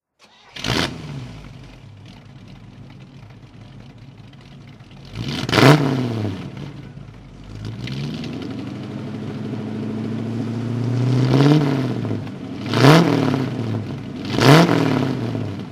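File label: corvette 4speed throttle, engine sound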